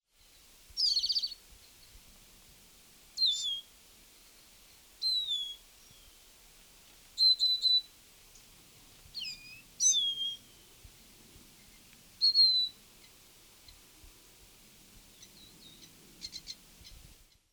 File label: Lesser Goldfinch